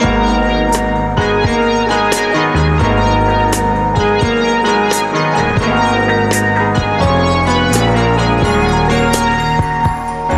keys; Phonky

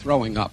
throwing up; actions

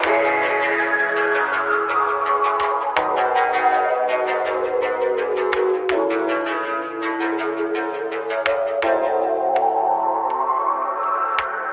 keys, sad loops

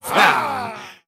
growl, Voice

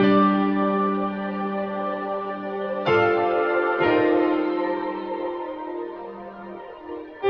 piano, Loops Sad

